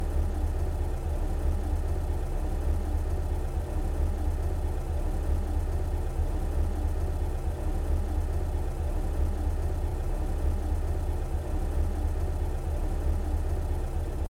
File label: Car Idle